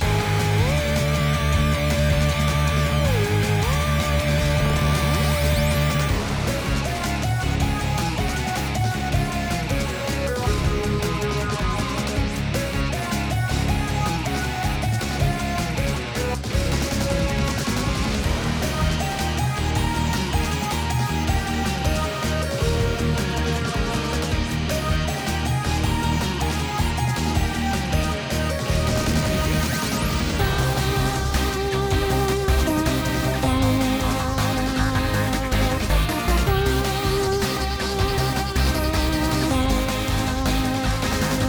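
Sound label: Loop Battle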